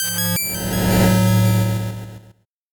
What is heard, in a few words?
8bit jingle fast